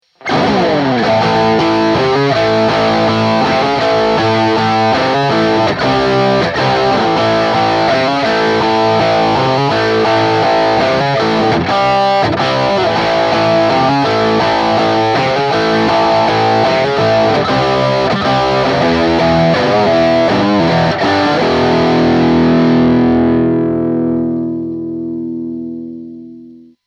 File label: Zoom G6